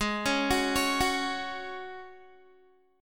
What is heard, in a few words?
Ab 7th Suspended 4th Ab down Guitar